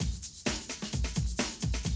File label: 130, Classic DrumLoops